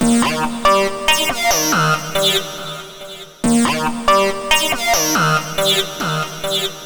HiFi, Techno Melody; TechnoMusicMaker

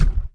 Brick Placed; Ricochet

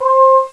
clean beat; Sound Effects for Multimedia - Volume 1; electronic sounds

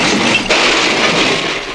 crash; Alien

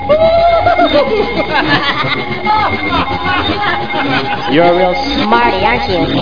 Laugh Smart

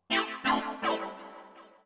SYNTH HOUSE 125 A; eJay; Keys

